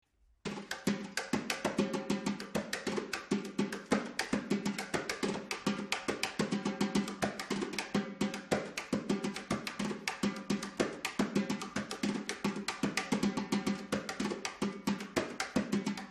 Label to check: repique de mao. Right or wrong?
Right